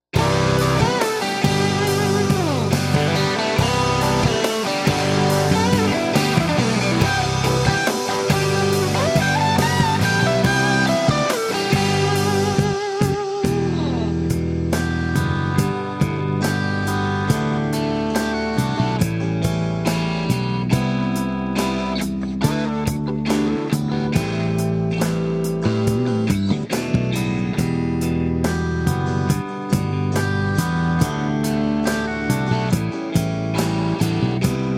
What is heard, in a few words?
rock remix